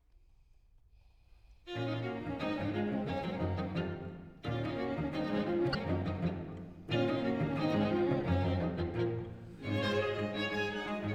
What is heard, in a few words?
String Quartet Presto; No 2 in D flat Major Op 15